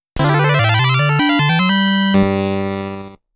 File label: 1-Up